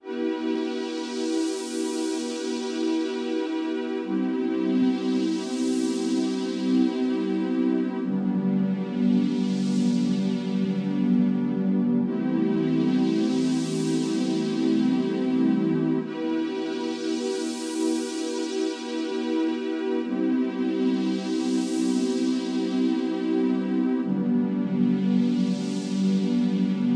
chilling funk nights 432 hz

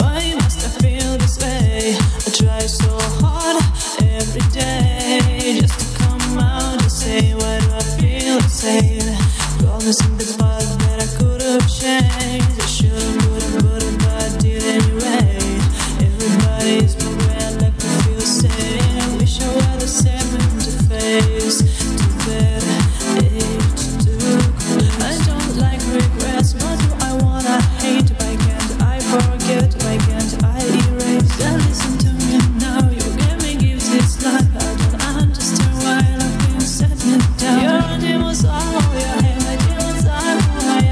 Disco Chill Vibes

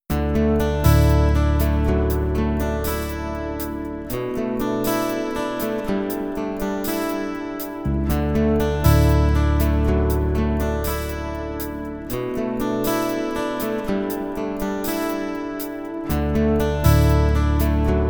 Acoustic Vibes

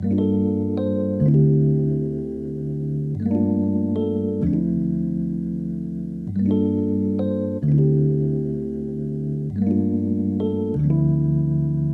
Rhythmical Funk Vibes

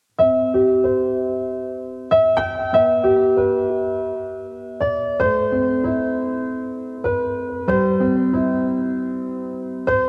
piano music 432 hz; NA ZAWSZE JUZ WIEM

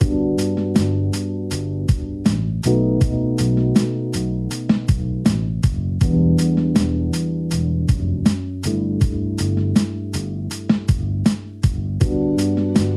Groovy Chill Funk

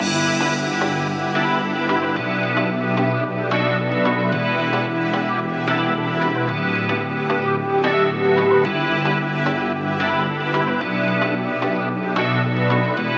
electro dance

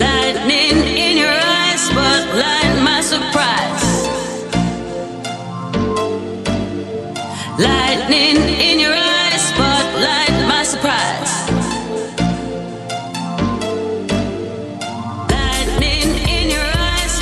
disco music 432 HZ

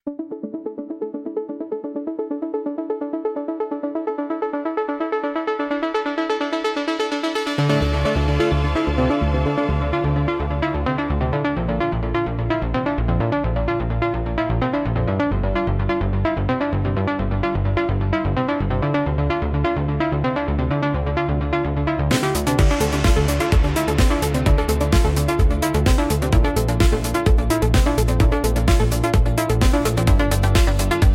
Electronic Impulse

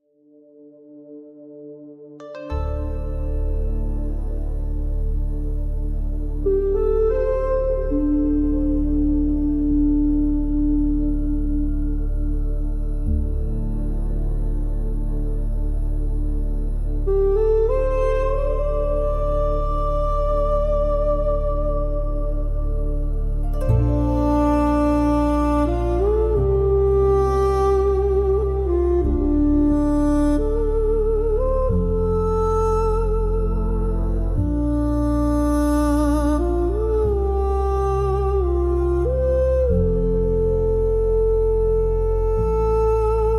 Hushed Harmonies; Whispering Winds

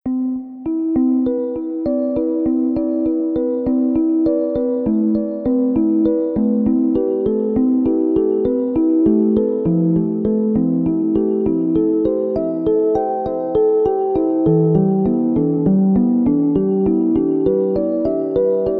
magic music relax 432 Hz; magic moment

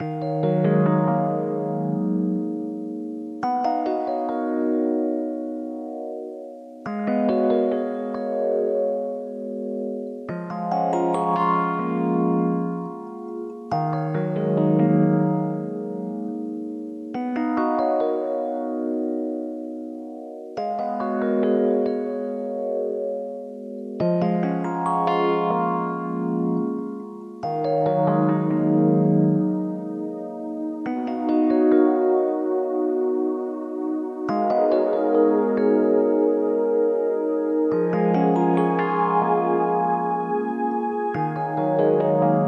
smooth jazz cafe

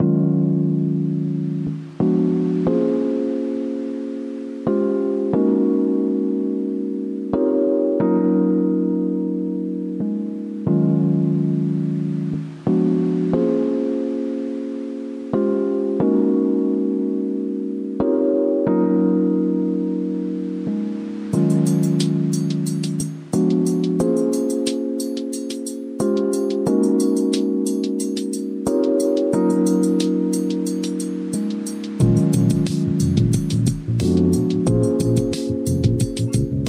smooth jazz; smooth jazz cafe

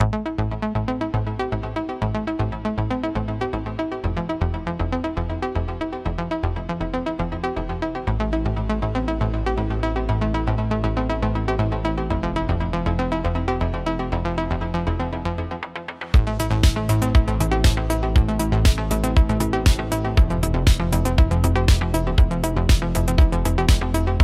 Electronic Impulse

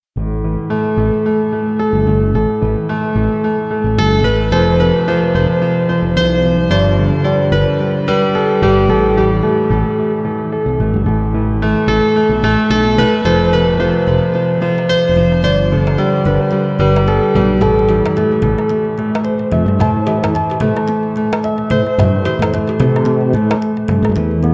the piano 432 hz; home again

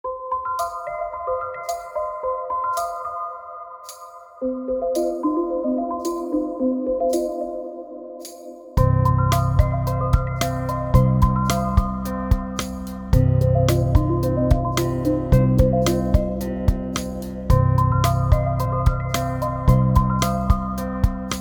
Guitar vibes

